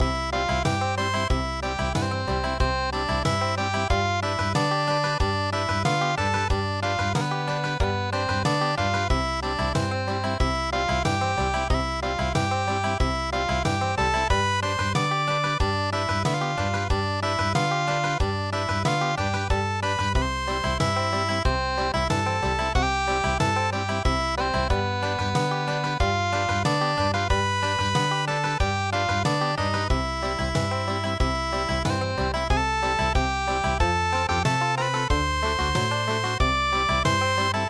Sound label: taue unpluged